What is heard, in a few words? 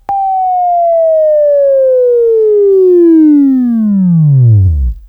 WAV Right, Sweeping; Sweeping Training program